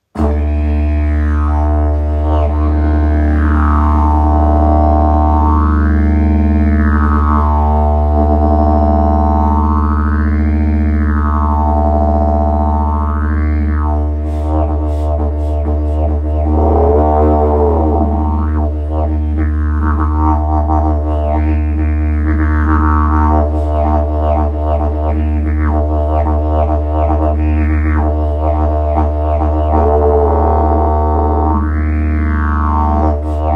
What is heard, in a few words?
Didgeridoo Sound